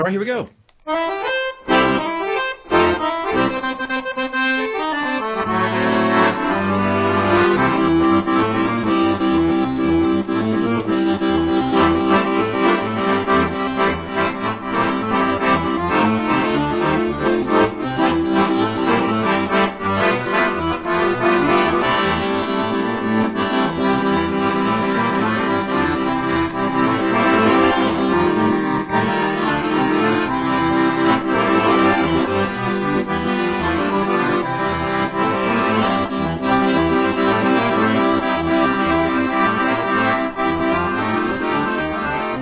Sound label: Accordion Improv